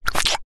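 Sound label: Throat Slit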